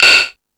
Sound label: Found Sound, E-MU Pro-Cussion